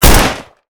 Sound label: akm fire; STALZONE